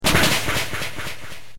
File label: super damage